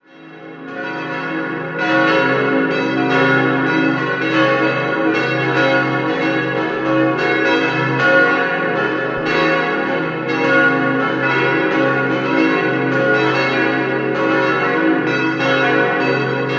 Gachnang, Glocken, Pfarrkirche; Gachnang TG; 5-stimmiges Geläut: cis'-e'-fis'-gis'-h'; 1952; in Erding gegossen; Bruder Klaus; Karl Czudnochowsky

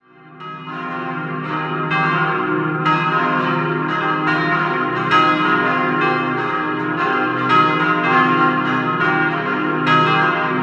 Freising Neustift, Pfarrkirche, Glocken; St. Peter und Paul; in Erding gegossen; 1924; Erdinger Gießerei Bachmair

